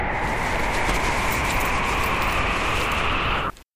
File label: parachute